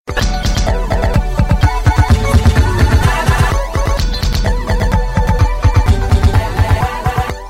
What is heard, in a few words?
Quick Beat